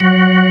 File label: Org3 F4#; Best Service Gigapack 2